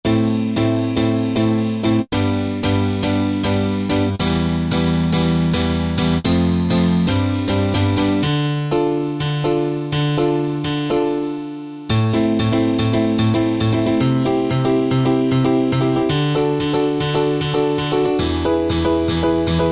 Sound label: Comp Piano 2; Roland SRX-05 Supreme Dance